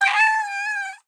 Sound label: Storm Cat; anemoi